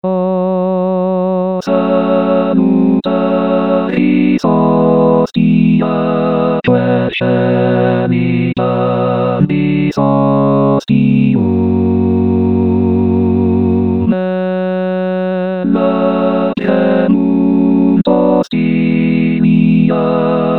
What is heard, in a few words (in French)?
Tutti; O Salutaris Hostia